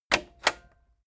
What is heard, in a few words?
cassette eject click slow heavy